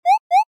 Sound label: Powerpellet Alarm Loop; 3DS; Xbox 360; gamerip; doodle jump; android